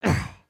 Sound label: Run; Lego Strombie; creatures organic